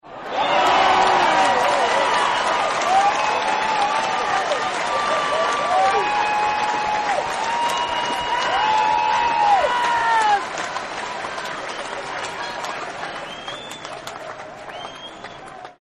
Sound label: Cheers Big; Crowds; Backgrounds